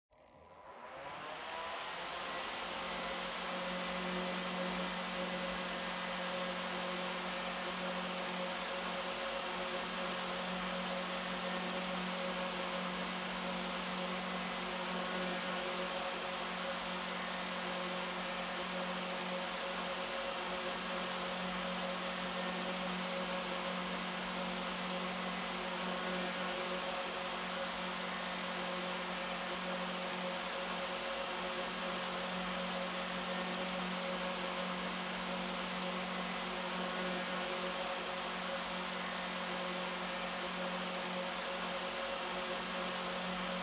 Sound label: Electric Fan Far High